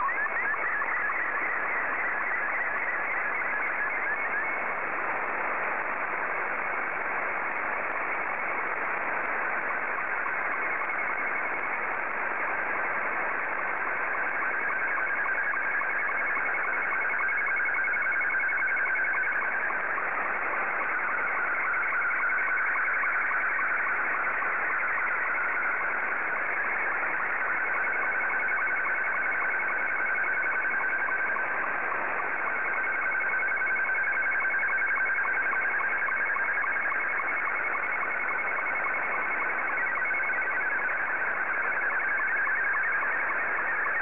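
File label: Scottie 2, slow-scan television; ZL2BMH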